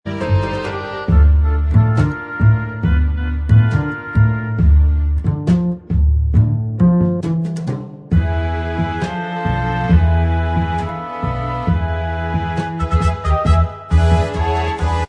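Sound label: instrumental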